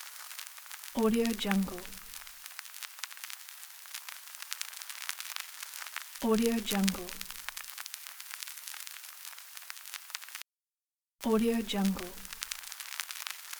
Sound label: vinyl record crackling